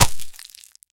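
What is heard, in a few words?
a heavy porous crunch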